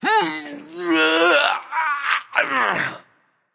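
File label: grunt lift, male; smite; voicepack; rama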